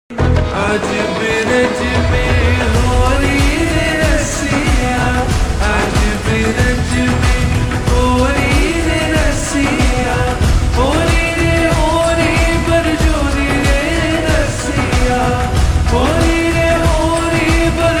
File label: slowed reverb